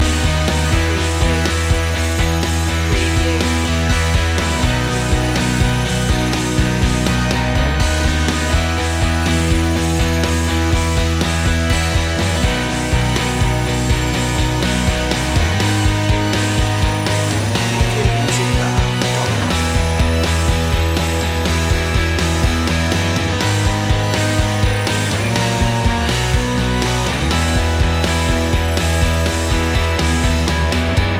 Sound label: released to fly loop